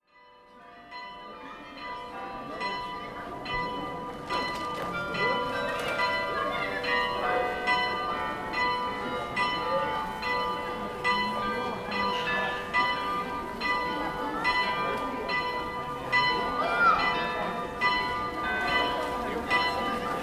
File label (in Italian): campane